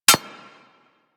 Bullet Impact Generic Metal; Surface

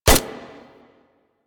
Fire Mech, Weapons; Latron Prime

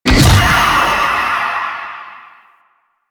Wraith Pre Death; Enemies; Warframe; Zariman

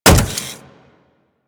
Riot Moa Step Default, Footsteps; Warframe

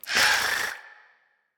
Kiss Vocal; Eleanor; Warframe; Nineteen Ninety Nine; Quests; Romance NYE

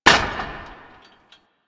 Debris Metal Stone; Warframe; Ambience; Entrati

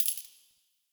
Ur Coin Drop; UI; Minigames

